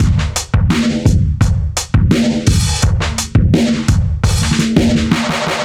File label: Old Drum, 85bpm, analogue circuit; musicradar